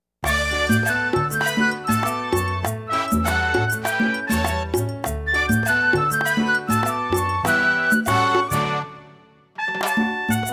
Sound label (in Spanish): Sabemos! (No Vocals)